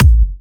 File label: Electro Kicks